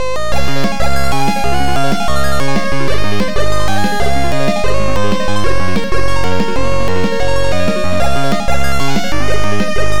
KEYGEN MUSIC RINGTONE; KYODAI MAHJONGG

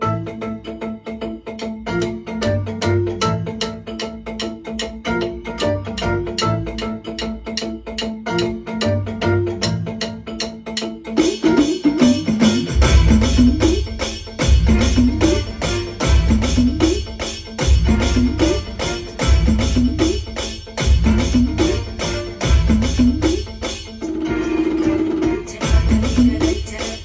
SHIV TANDAV OCTAPAD MIX

